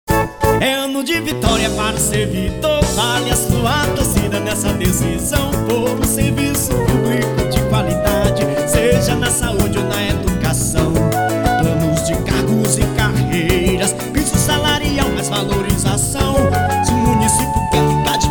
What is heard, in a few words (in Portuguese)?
Samba Radio; Campanha Salarial 2014